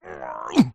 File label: human male short fight vocalisation